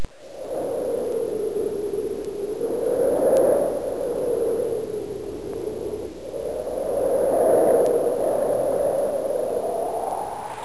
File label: wind and rain; Soundsation; Nature